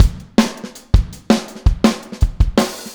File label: Full Mix, Drums, 162 BPM; Verse; Drums from the Big Room The Mixes; Take Your Toms Off